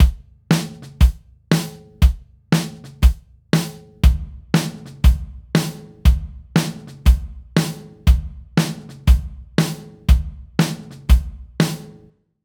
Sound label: drums; flo x